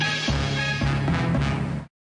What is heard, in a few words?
Scene Transition Effect; Armada